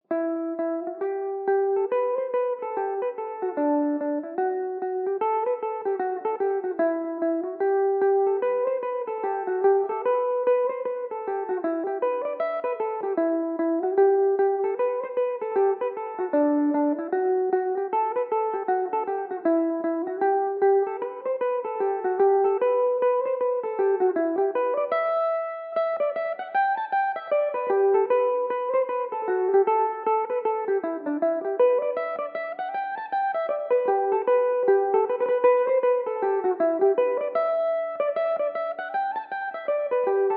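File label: E minor, irish mandolin; pride of petravore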